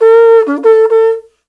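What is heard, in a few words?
Afro Horns, horn phrase; Roland LCDP11 Africa VOL-1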